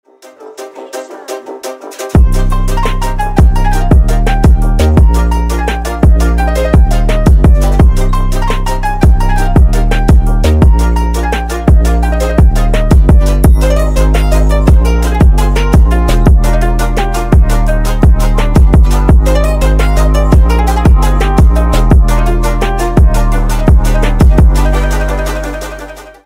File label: phonk remix; bad piggies; igry